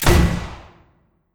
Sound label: Mortar